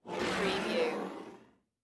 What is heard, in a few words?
Glass Slide Counter